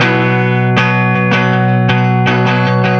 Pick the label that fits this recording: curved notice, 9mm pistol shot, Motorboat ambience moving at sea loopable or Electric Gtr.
Electric Gtr